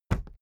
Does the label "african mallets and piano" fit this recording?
No